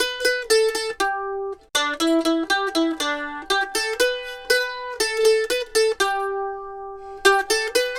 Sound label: Mandolin Lead; Continental Drift Aftershocks